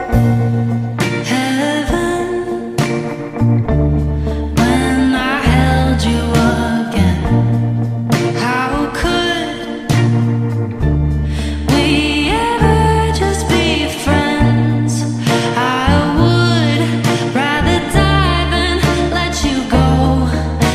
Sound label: background music